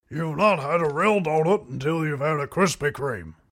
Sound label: mmm